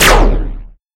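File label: attack distant shot laser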